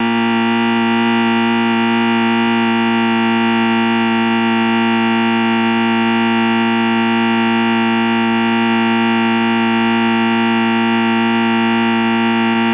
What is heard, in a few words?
Solenoid Buzz Alarm; buzz & hums